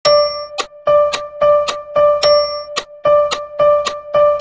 motifs rythmiques mesure 1

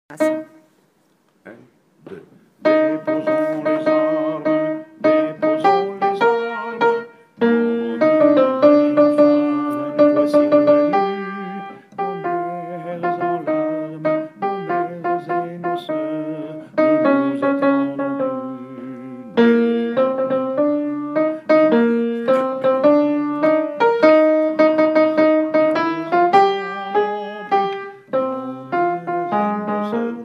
basses; 1er partie

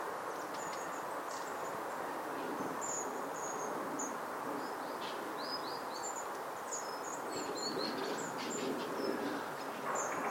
roitelets huppés

